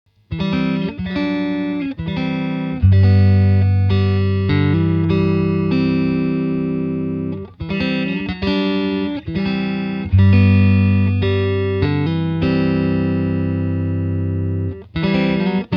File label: Electric Strumming TG500